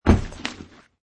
Bag, Dropping off leather travelling luggage on the ground; Drops; Objects